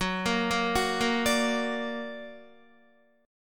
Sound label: Gb Augmented Guitar; 13,13,10,11,10,10; Gb+ arpeggiated